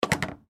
Dishwasher Open, Dishwasher Open Soap Flap Plastic Lid; General Electric Ge Appliance; Household; Blastwave